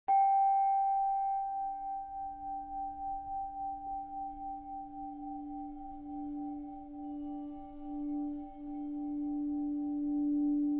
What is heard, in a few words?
crybowl